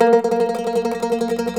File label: MANDOLIN, Tremol Mandolin; StTremolMandolin